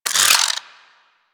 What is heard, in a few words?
Shotgun Reload Spin Start; DE; Warframe; Weapons; Skins; Zoboz Shotgun Skin